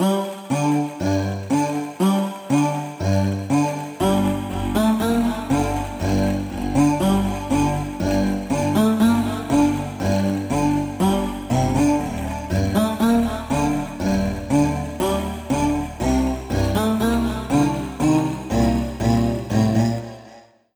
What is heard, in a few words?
Synth